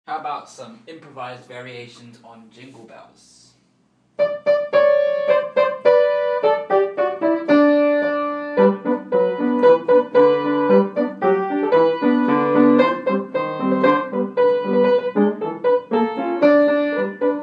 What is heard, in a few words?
fun improvisations on Jingle Bells